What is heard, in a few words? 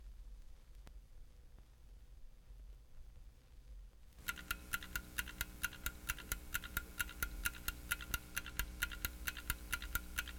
Ticking Then Alarm; Jac Holzman; Authentic Sound Effects Volume 2